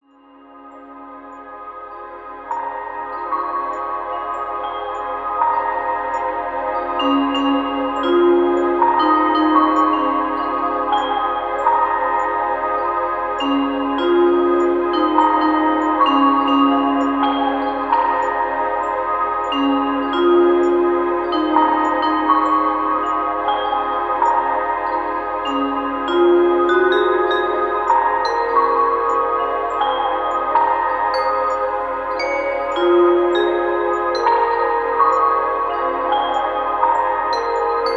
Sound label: cave